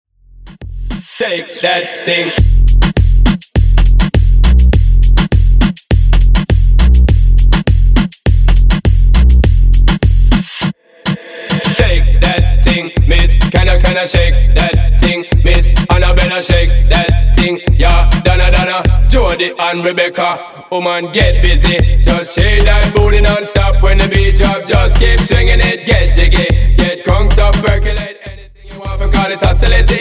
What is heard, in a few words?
Moombah 102bpm